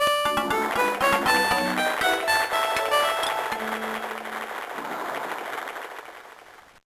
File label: Stage Clear, GBA; Fly Swatter